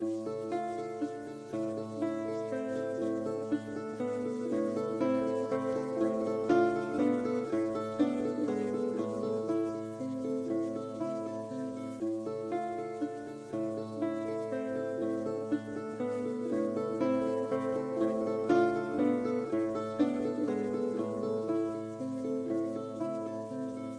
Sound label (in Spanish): loop guitarra